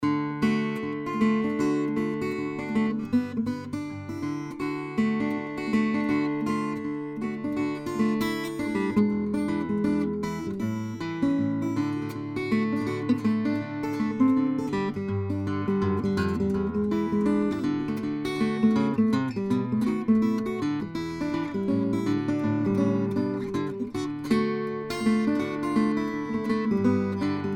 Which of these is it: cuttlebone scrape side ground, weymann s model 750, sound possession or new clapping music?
weymann s model 750